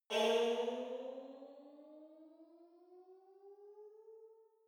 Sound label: sci-fi vehicle turbo activate distant; raumschiff